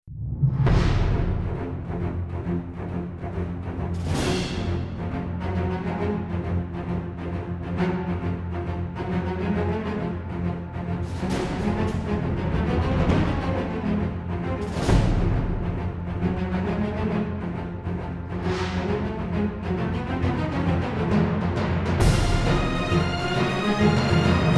perc strings

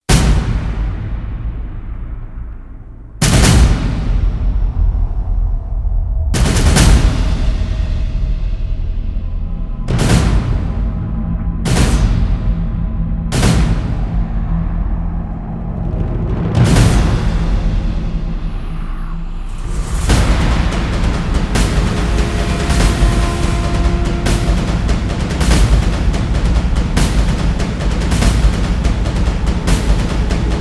drum

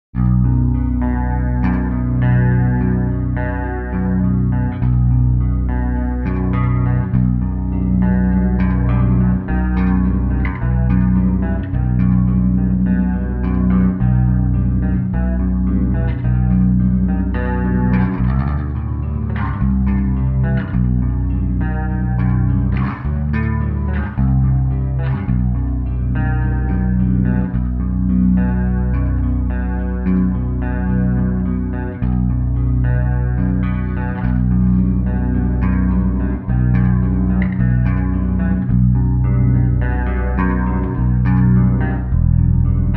bass solo

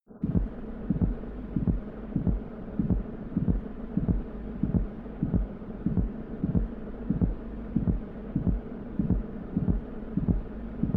distant heartbeat

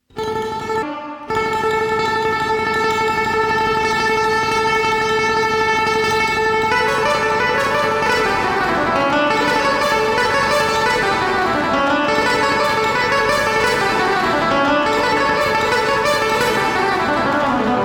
acoustic

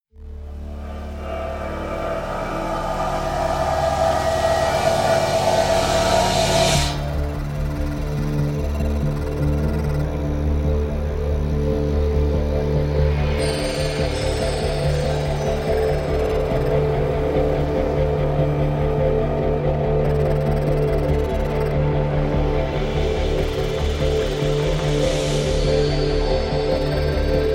pads and pulses

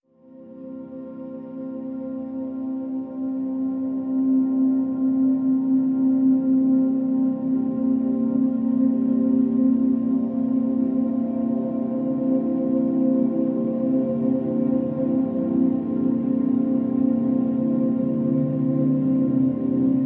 pads distant lights c

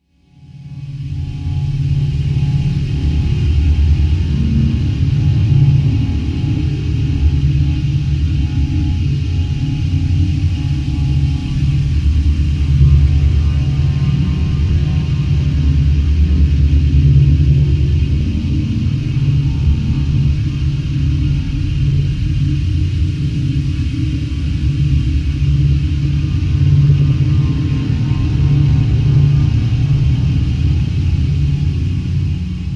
drones subtle slime

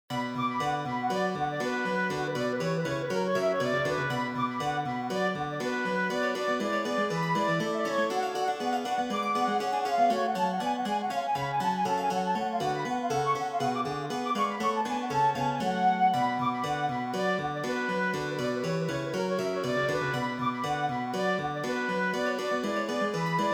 badinerie arr for flute; orchestral suite no 2 in b minor bwv 1067 vii

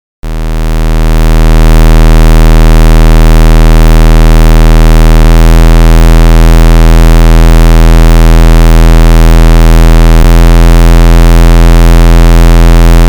square waves from 40 hz to 70 hz 5 seconds each

